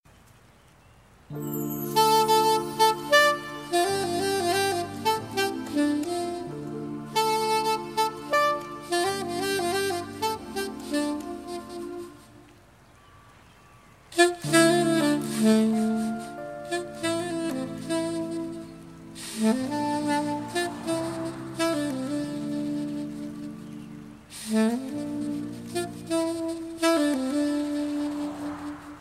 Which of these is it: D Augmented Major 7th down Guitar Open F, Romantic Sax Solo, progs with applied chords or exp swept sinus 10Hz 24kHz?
Romantic Sax Solo